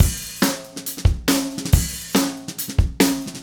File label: Acoustic Kit, Drums & Percussion; Electronic Point Blank